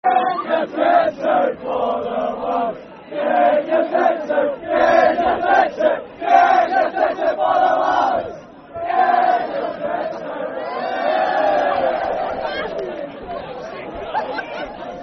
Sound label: Football Chant (Soccer Song), Hibernian; Get Em Out For The Lads; Hibernian Hfc